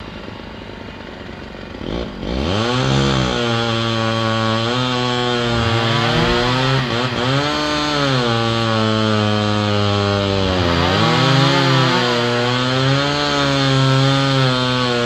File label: Chainsaws 2 Cutting